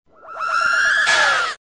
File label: scream